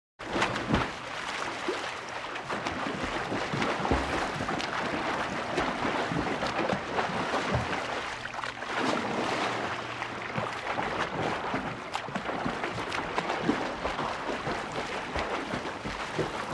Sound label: Swimming Doggie Pad